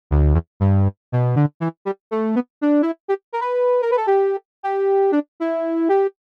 sub sample; ewi reason sounds

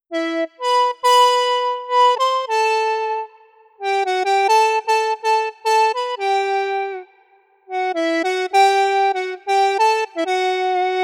super m square

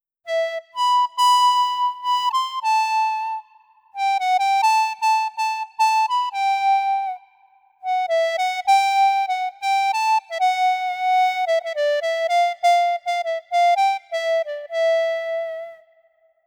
super m airy clarinet

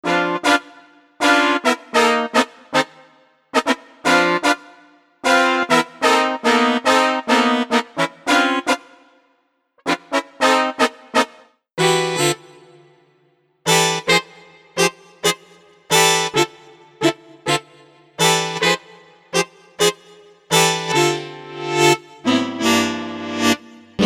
sampler bigband

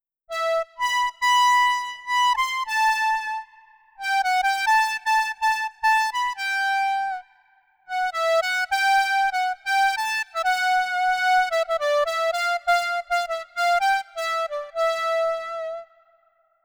super ob noisy lead

